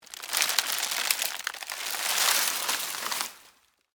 Foliage Bend Move, Texture, Foliage, Bend, Movement, Palm, Frond; Rustling; Blast Wave